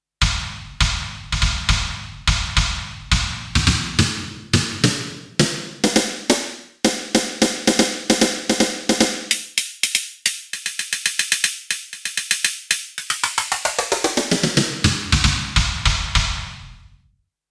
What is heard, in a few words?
Snare Pitching